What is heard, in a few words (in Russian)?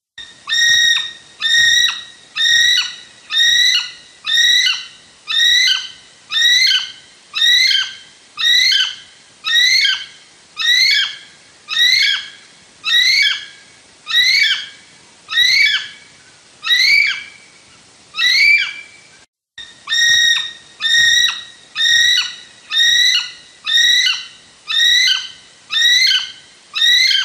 Zvuk pticy kivi golos pticy kivi penie